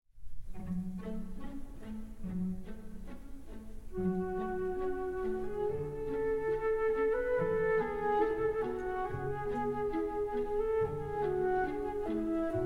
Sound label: Pavane, Instrumental Version; Fauré; Op. 50